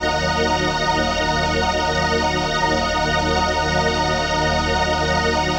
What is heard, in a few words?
PHASE PAD